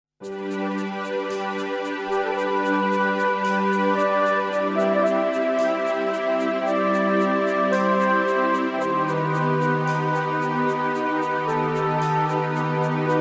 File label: Flutes In Love